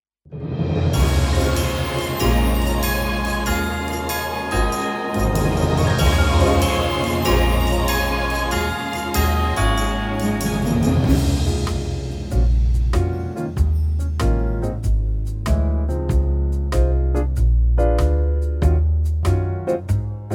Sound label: instrumental